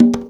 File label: CONGA, Percussion; InVision Interactive; Percussion Slam; PROGFROG